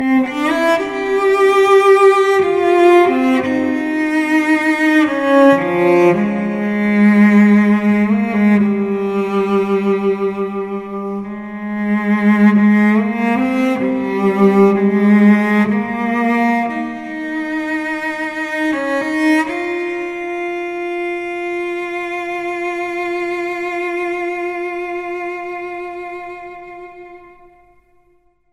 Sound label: requiem cello ringtone; samsung ringtones